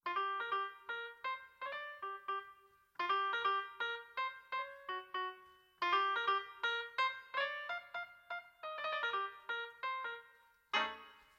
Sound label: jazz HPF; high pass filter